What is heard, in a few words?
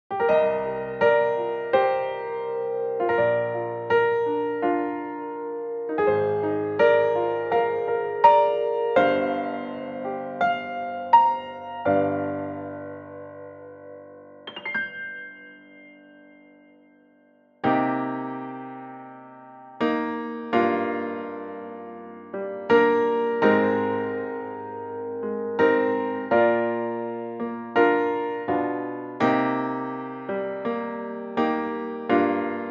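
Rain piano Inst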